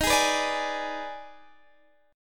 D sharp Major 7th Suspended 4th Sharp 5th, Guitar, Open D; x,18,18,17,17,18; chordshape